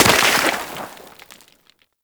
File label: Trench Small Dirt Impact; Gameplay; Warframe; Tau